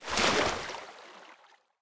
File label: Water In; SandBox Musics